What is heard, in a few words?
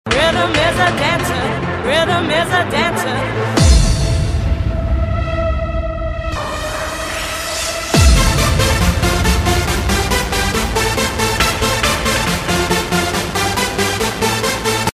rhythm